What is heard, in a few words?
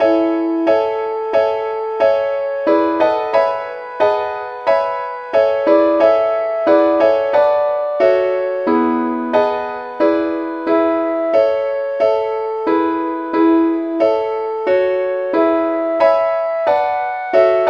advanced lead, key A; SAINTTHOMAS